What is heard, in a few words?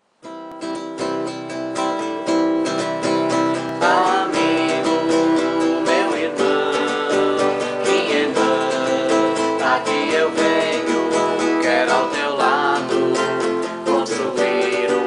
vocal